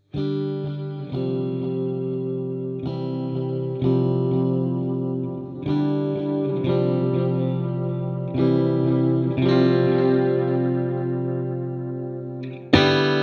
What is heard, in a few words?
X-SPACE PLATE; xgear